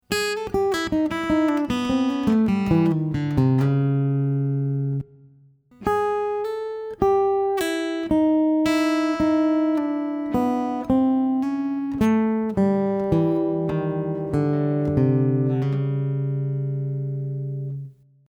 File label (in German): Lick A7 Akk